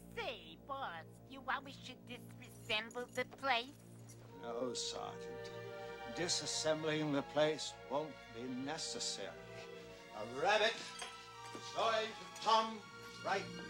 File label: shave